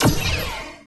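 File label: FG SHOTS, future gun; Total Control - Action Cutz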